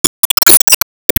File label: Enemy Ufo Teleport; Disney Star Smash; Android, iOS, Mobile